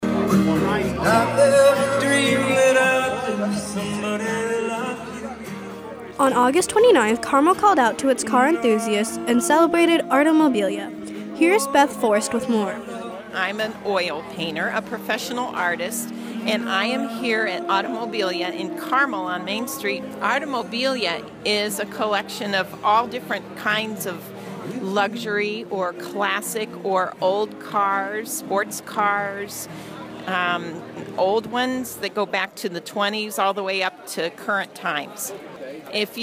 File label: BEAT